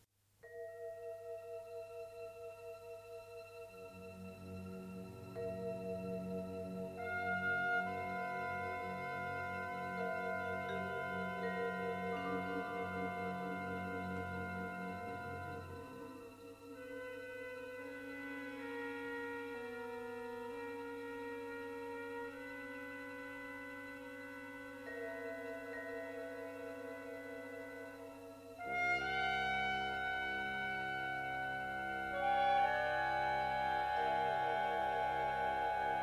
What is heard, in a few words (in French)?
pour orchestre symphonique; RTBF